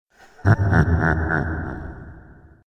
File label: Scary Laugh Ringtone